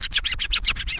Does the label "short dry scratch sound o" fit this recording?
Yes